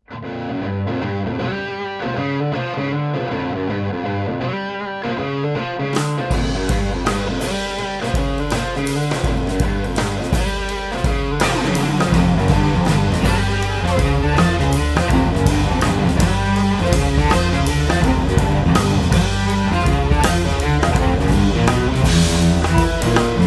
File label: Slap